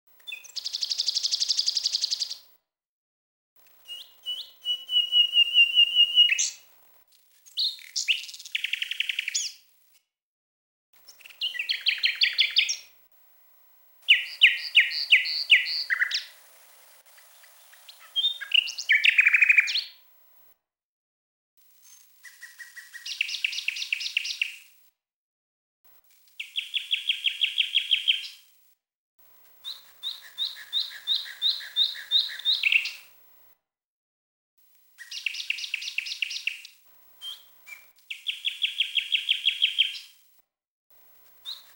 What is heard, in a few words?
Nightingale